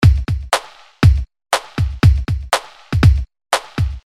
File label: Retro Tinged Garage House